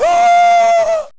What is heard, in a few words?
ooo